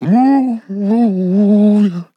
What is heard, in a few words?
man disco vocal fills 120BPM Fm; move yeah